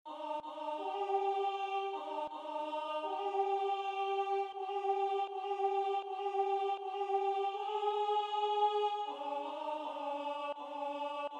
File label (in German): guten Abend gut Nacht, Alt